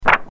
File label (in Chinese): 丢纸张